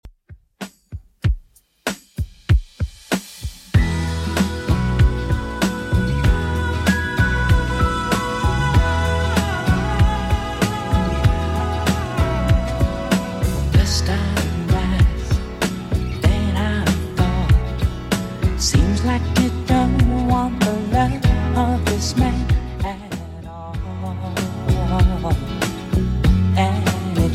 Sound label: 80s Redrum 96bpm